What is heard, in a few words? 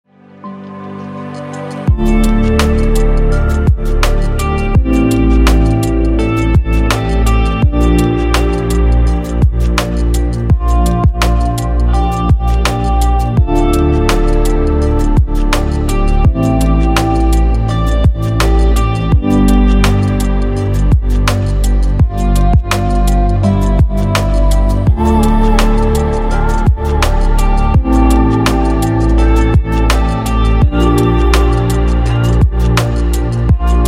Samsung Ringtone